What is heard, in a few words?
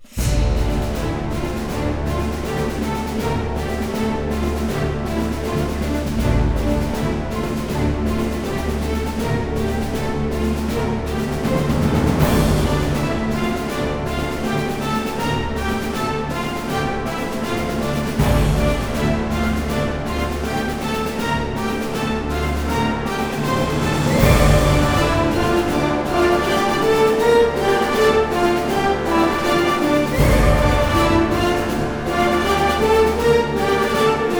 The Dark Forest No Choir; Transformers Prime: The Game; Wii